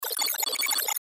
Sci Fi Digital, Sci Fi Digital Computation Fast Sci-Fi; Cartoon; Cartoon Sound Design